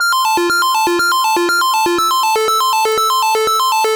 ringtone F 121, synth loop; Acidized; Synth Loops; Zero-G; Soundclash Nu Dance Electro; 121bpm

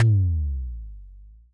TD-20 Tom B, Roland TD-20 Toms

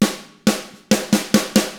SLOW ROCK IN, Drums; ILIO; Double Platinum Drums 1

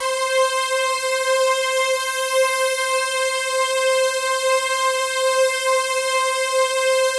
SIZZLE C6, SIZZLEPAD; Drums In Your FACE!

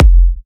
Kicks F; markus hakala

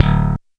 thm bass; Korg; DDD-5

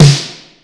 medium snare; Greytsounds VOL-1; PROCESSDRUMS

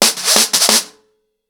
FX 90 BPM, acoustic drums; The Roots Drumloops

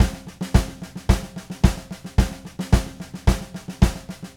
Red Maple Groove 110, DW Red Maple Kit

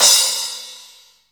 CYMB

